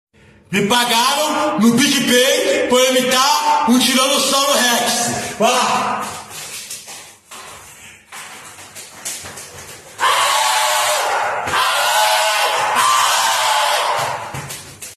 tiranossauro rex

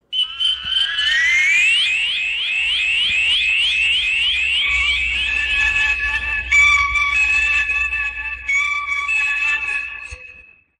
sinal de tempo e placar

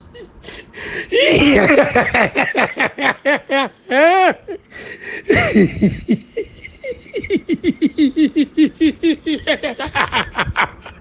risada

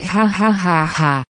hahahaha efeito sonoro

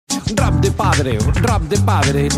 rap de padre

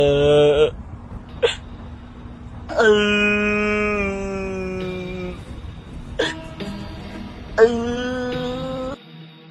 chorando

